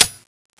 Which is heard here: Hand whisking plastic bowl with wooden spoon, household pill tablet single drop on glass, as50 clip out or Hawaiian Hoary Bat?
as50 clip out